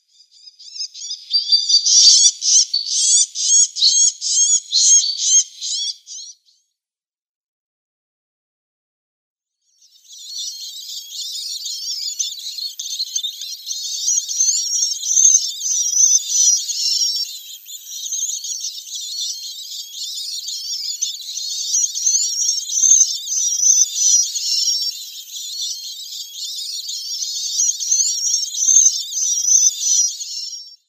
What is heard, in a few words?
Red-backed Shrike nestlings